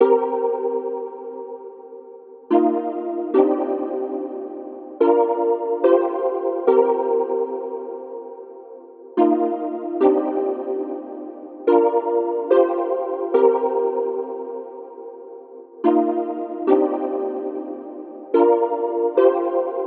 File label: 144 BPM C Min Chords; Cymatics; Solar Eclipse; 2022 Melody Collection Platinum Expansion